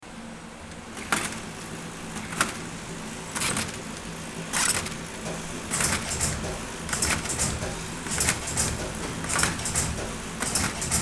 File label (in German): CD maschine